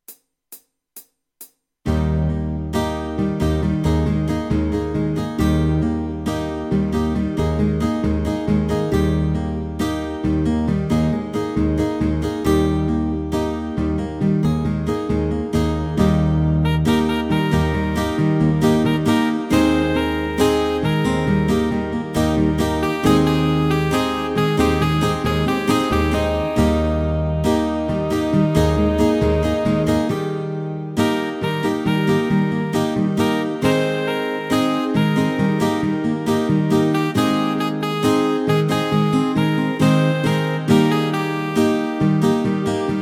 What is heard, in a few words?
Hymn